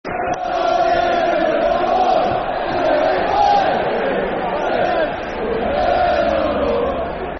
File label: Football Chants; No Nay Never; Blackburn Rovers Brfc